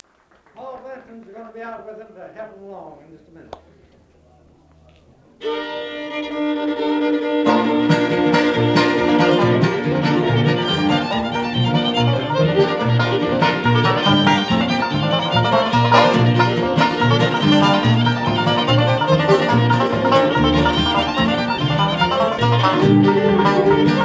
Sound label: Instrumental Intros; Bean Blossom